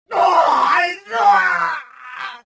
death, rodian

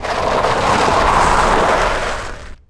moto slide dirt; terrains; motox; Tony Hawk's Project 8; XBOX

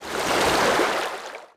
wipe; UI; Splashdown; XBOX

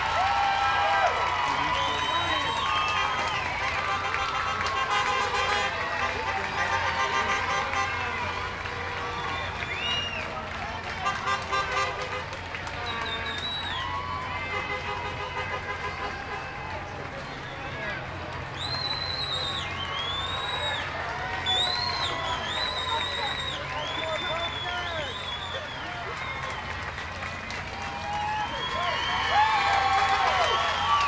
Crowd; True Crime Streets Of LA; XBOX; Bonus Race

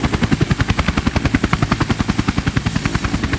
helo loop; Tony Hawk's Project 8; XBOX

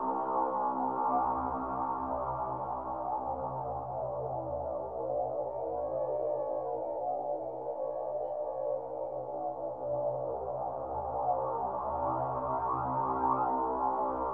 THEME pad; Myst III Exile

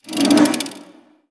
Rotate Interface; Myst III Exile

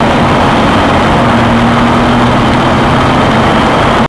mercedes driving; Max Payne; tenements

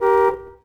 shops car horn; Tony Hawk's Project 8; XBOX

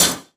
cursor

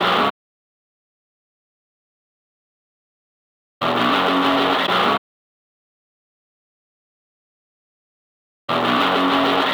Guitar; Matt Hoffman's Pro BMX 2; XBOX

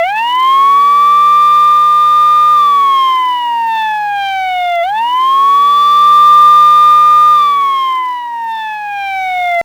cop siren loop; cars; Max Payne

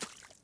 puddle, footsteps

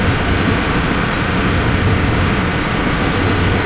engine; Star Wars Knights Of The Old Republic II; XBOX

